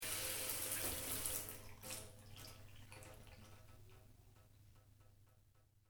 Shower off, Shower, Light: Switched Off; Bathroom; Household; Stuart Duffield

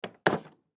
Desk Door Open Victorian Cylinder; Desk 1875; Miscellaneous Door; Blastwave; Foley

